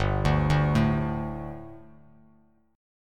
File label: G Suspended 2nd G, down, Bass Standard; Gsus2 arpeggiated; JGuitar